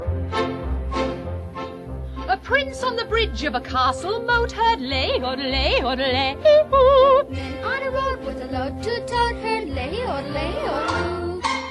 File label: music; mystery quiz 12 zodiac signs